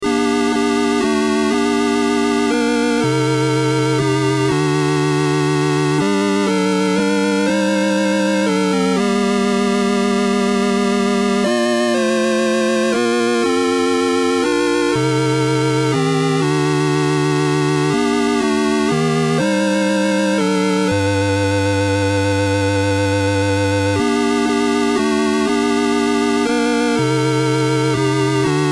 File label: Lullaby; Thou Little Tiny Child; 27 Christmas Carols